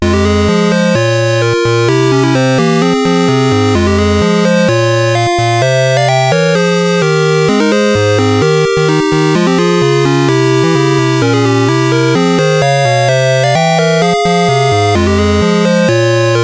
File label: Dragonworld sid CSG8580R5